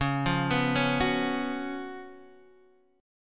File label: Db 11th Db, down, Guitar Standard; arpeggiated